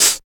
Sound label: HAT; East West - Phat & Phunky CD2; 90's Sample CDs